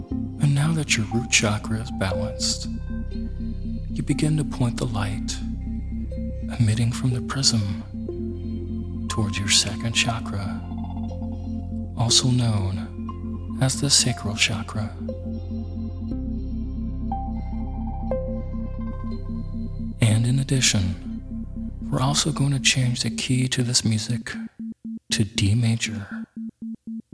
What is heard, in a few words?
Isochronic Tones; Chakra Balancing